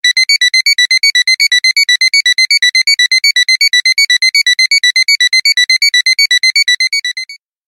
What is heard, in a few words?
Alarm Sound; Bing